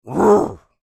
Creature Grunt Short, Human; Voice; Big Room Sound

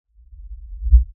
LFE Reverse, Lfe Reverse Element Swell Low Bass; Swells; Sound Effects:Sound Design

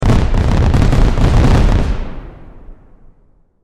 Explosion Fireworks Long Burst; Chinese New Year

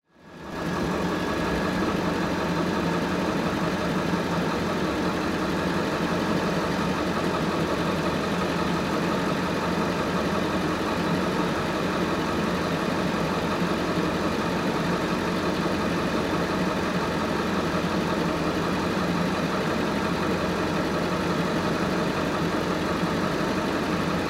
Ambulance Engine Idle Interior; Emergency; Emergency Vehicles

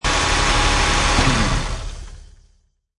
Mustang V6 Shut Off Engine Perspective; Cars; Sound Effects:Vehicles

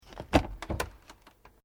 Door Pivot Interior Plastic Cabinet Small Open

